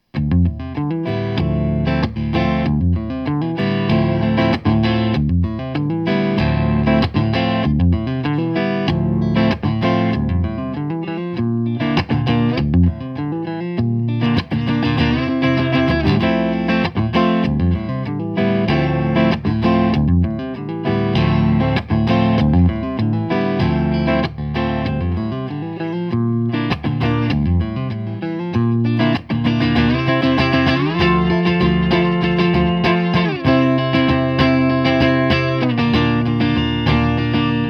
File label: Rohr 15 1x12 Combo Fender Telecaster